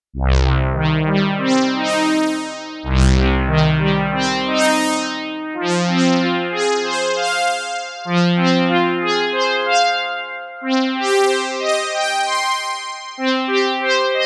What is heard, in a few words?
NS EX vs NS 4 Saw Resonance Unison2